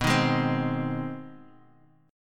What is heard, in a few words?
G sharp Major B; strummed; JGuitar